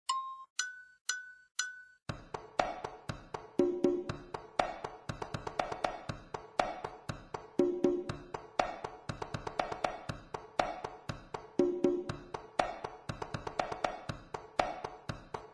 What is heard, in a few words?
left hand, congas; exercises; Laurent Lamy